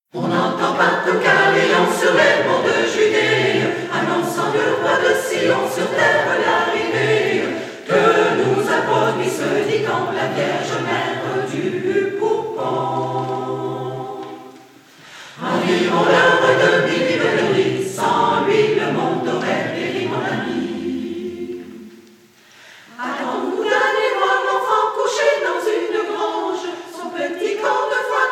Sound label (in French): On entend partout carillon